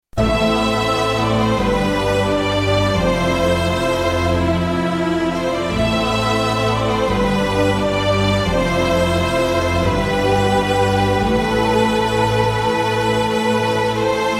cd music game over

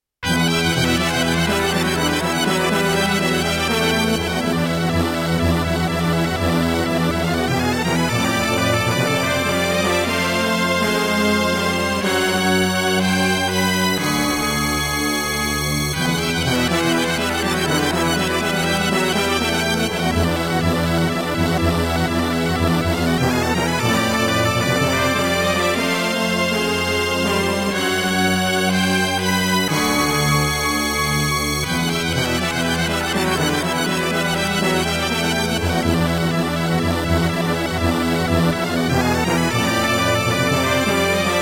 Music Bison; Super Street Fighter II

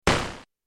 pistol 2; Prototype

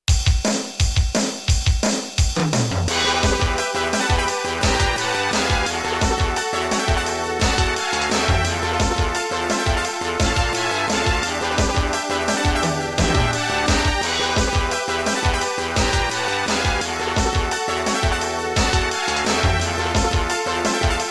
Music Bonus Stage

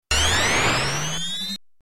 Hober; Air Bike; Prototype

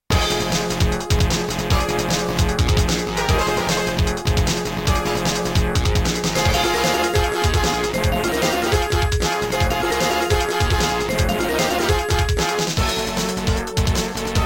Music Bison; Super Street Fighter II

